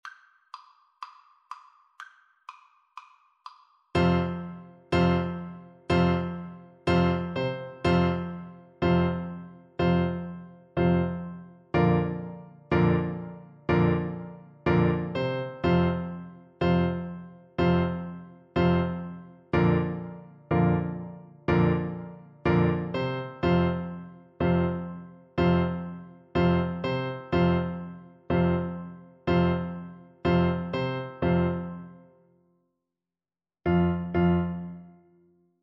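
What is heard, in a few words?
1812, marimba, Percussion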